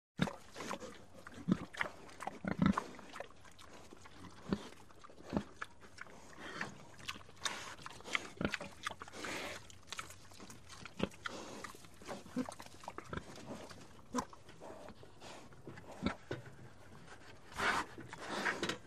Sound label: Pig Grunts Eating